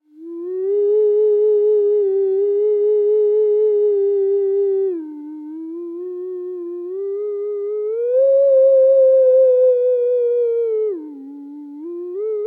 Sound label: Theremin